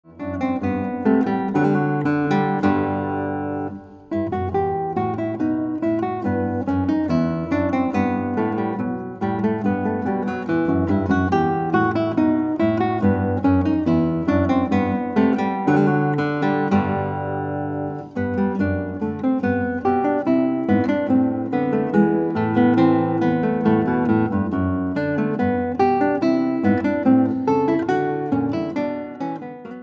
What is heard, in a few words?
Guitar